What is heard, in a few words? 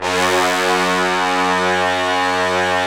BRS BNSF25R, Roland LA Composer, Tbns; 90 s Sample CDs